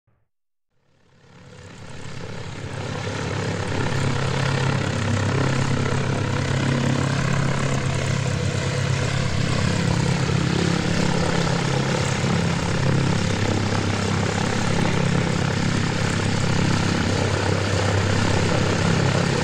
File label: Cessna Taxiing